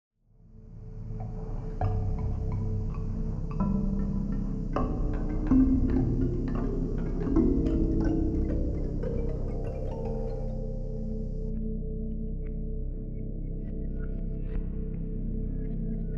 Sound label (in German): die wand musik